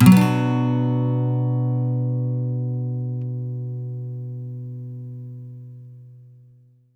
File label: MIN SWEEP B, Electric & Acoustic Guitar; USB Soundscan vol.04; AKAI